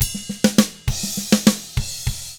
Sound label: ROK, Drums